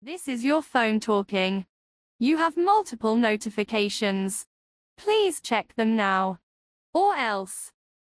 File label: multiple notifications ringtone